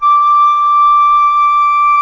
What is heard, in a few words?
flute high